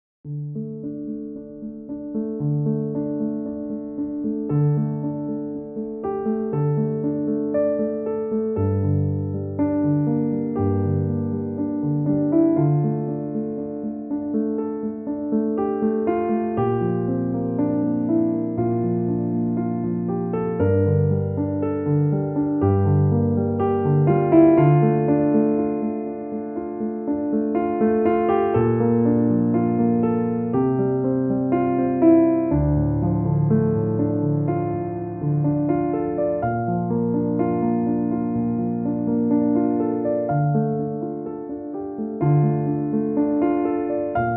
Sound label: Clean Piano; piano orchestral